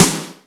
Rock L, Yamaha DTXpress Acoustic Snare

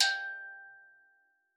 G4, STEELDRUMS; Xsample VOL-4

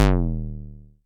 bass stabs, Drum Machines FutureRetro FR777

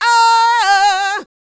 D YEAH, Vocal R&B Dance; DB DANCE

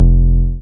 TB SQ F2, Roland TB-303 bass

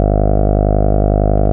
TAURUS A2, synthesizer

